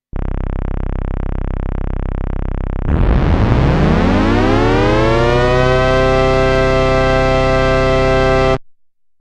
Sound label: default saw